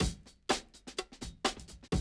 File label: Classic DrumLoops, 125